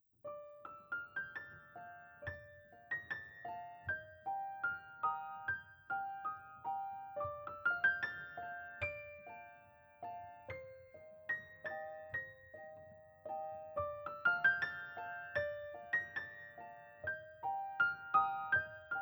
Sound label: Piano SCX25